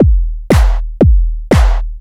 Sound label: 4 floor beat, trance